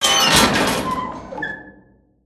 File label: Gate Open; foley